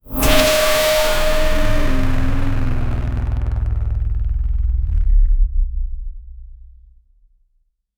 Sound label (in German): ein tiefer bass pulsiert, destroy